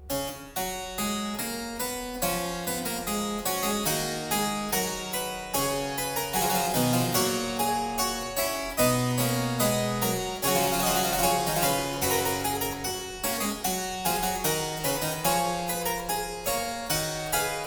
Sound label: Quodlibet a 1 Clav; Bach - Goldberg Variations, BWV.988; Huguette Dreyfus; Variatio 30